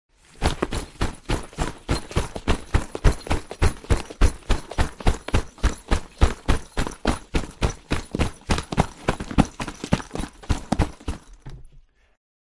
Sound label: duffle bags foley gear duffle bag a movement sequence run; silverplatter audio